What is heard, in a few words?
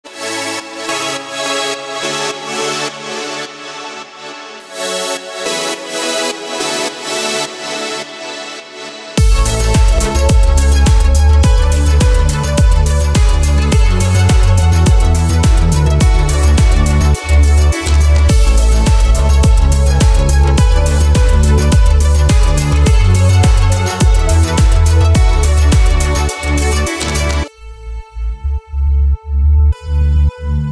Synth Race